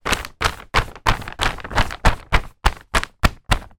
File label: twister game sheet movements hand patting flat multiple